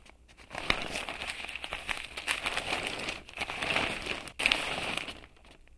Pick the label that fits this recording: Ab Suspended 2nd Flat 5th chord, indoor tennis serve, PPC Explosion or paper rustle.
paper rustle